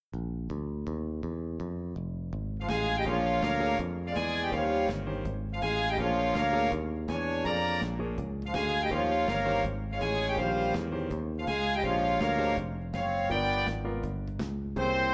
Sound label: BLUES for Jazz Band